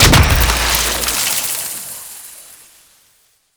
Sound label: Cactus Explosion; Warframe; Duviri; Gardening